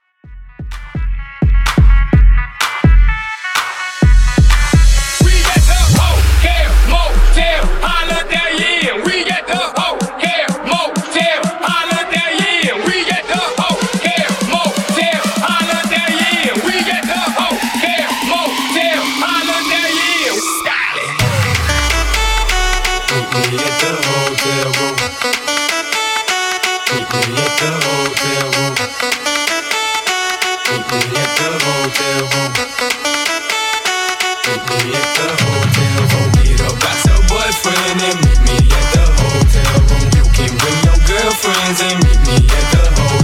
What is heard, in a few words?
Epic Intro